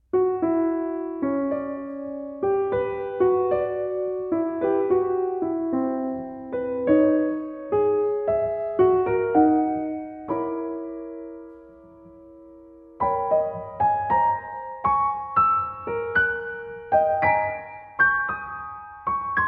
two little piano pieces; ayatori

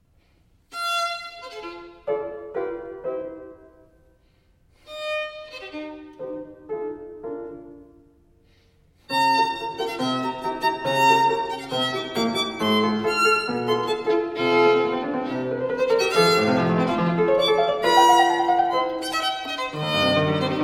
sonata in F major allegro

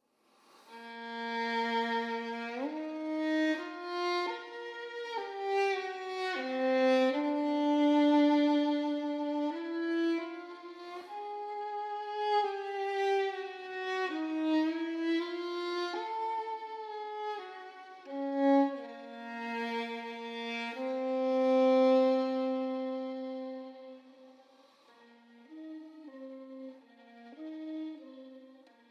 melodia from sonata for solo violin

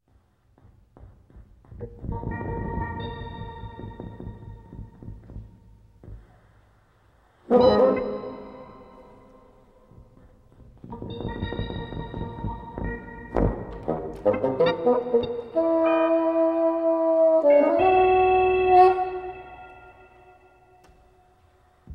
improvisation; on strange attractors v1.0b

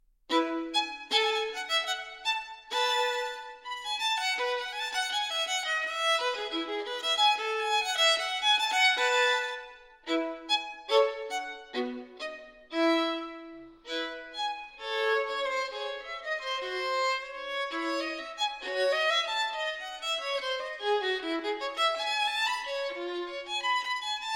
violin partita in e major gavotte en rondeau; violin partita no 3; bwv 1006

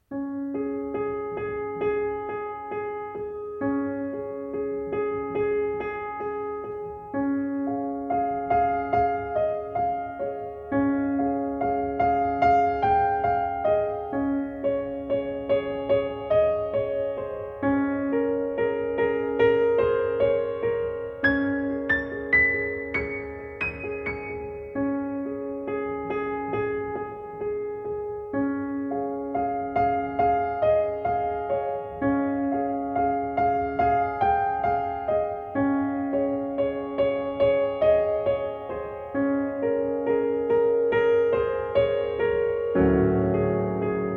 raining andantino con espressivo

